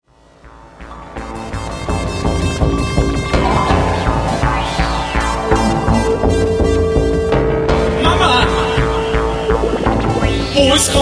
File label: Theremin; atelierTheremin